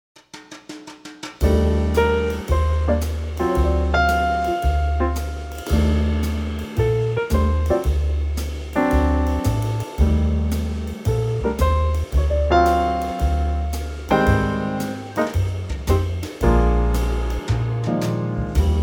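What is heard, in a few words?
E flat swing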